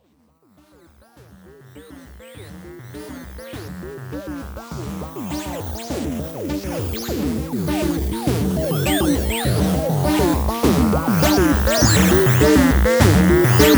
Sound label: A100 and MS20 Sample